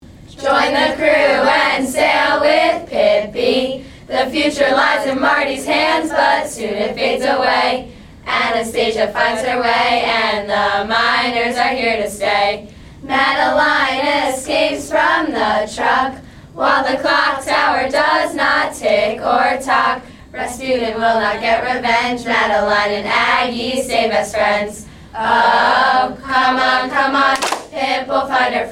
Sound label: Camper Fun campsongs